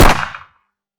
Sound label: Gunshot